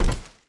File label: grunt iron walk